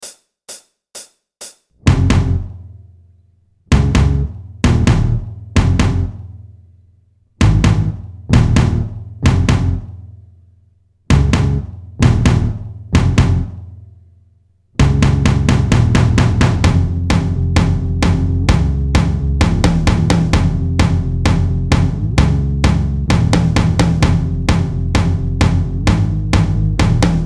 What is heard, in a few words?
jam no guitar